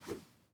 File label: throw; teardown ps5 windows xbox series xs gamerip 2022